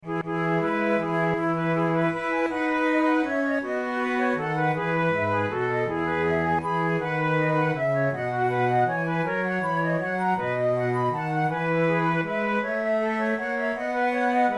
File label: trio sonata corrente, flute tunes; corelli